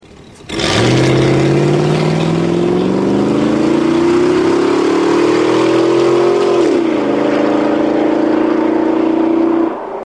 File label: escalade yukon denali sport exterior launch, exhaust systems; corsa